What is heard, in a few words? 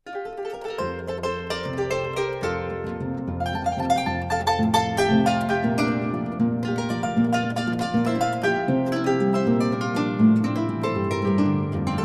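Joropo azul, auf Harfe & Hackbrett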